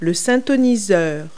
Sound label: tuner; Multi-Lingual Talking Picture Dictionary